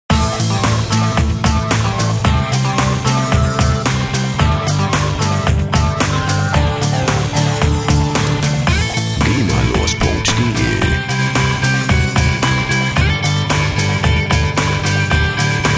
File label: beatscape